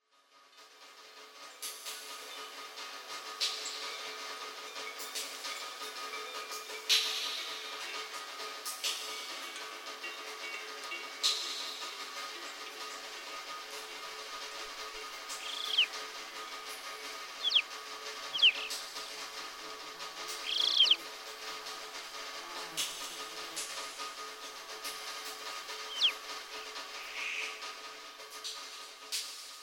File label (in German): Regentropfen Berghuette; Geraeusche; an der berghutte